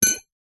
metal objects handles x2 knock together clink on carpet